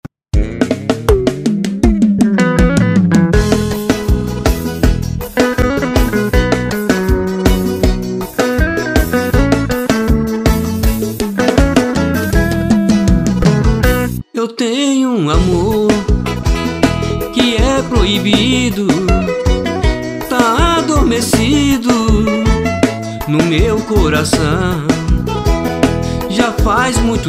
seresteiro do brega

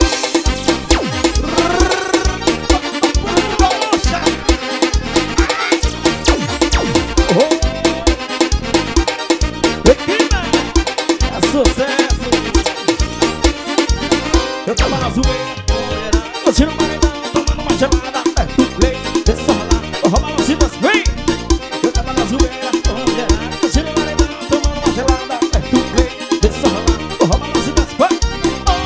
forro ao vivo

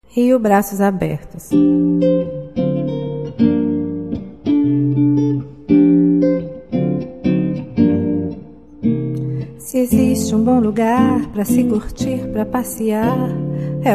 samba

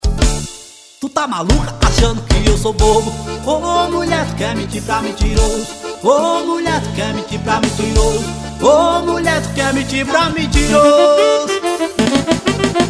vocal

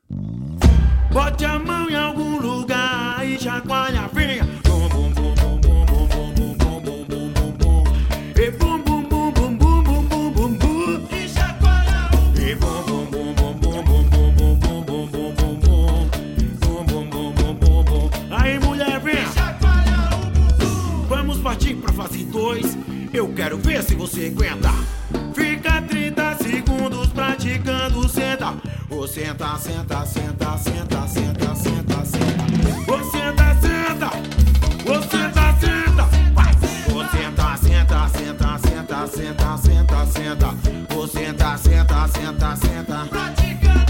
esquema videogame

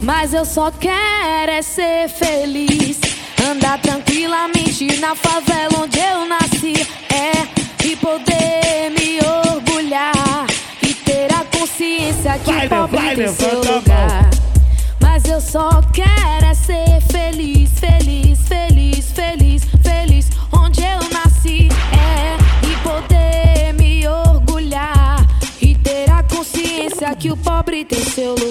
rap

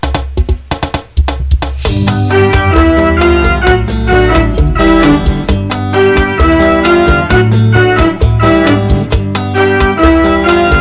groove arrastado

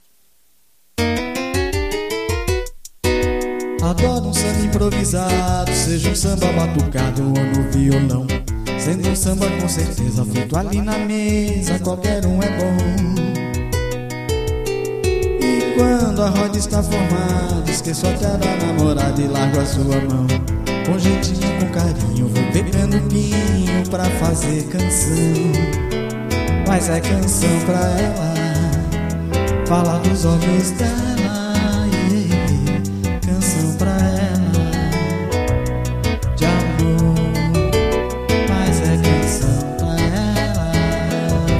samba improvisado

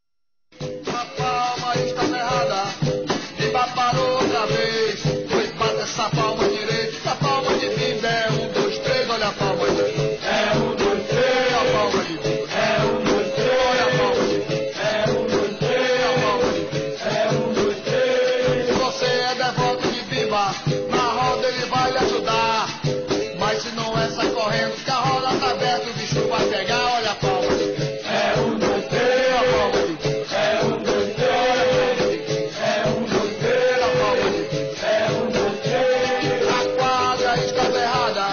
palma

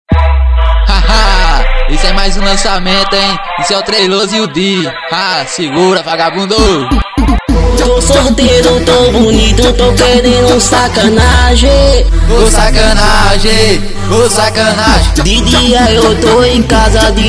funk

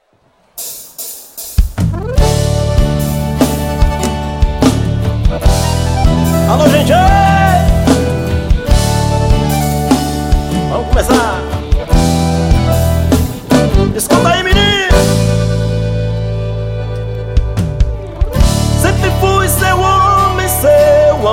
sertanejo, introdução ao vivo

